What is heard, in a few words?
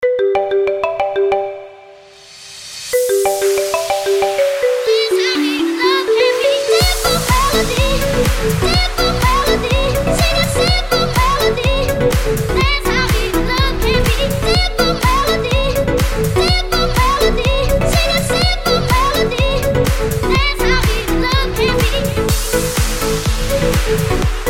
Marimba